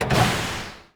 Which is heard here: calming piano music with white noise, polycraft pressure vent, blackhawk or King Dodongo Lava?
polycraft pressure vent